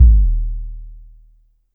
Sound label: Drum X Sub, Pearl Drum-X